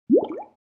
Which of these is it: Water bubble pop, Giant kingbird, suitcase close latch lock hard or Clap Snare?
Water bubble pop